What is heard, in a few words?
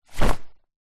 jacket safety thick fluro hi vis drop floor; zapsplat; foley